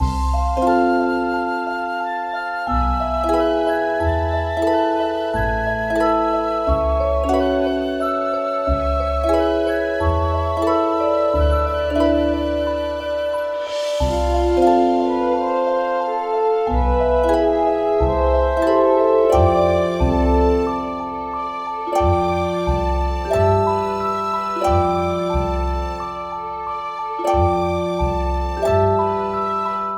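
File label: Cartoon music; Viking Invasion 2 Tower Defense; 3DS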